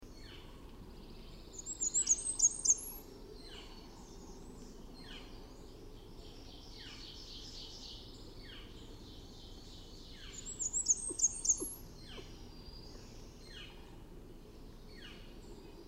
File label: Blackburnian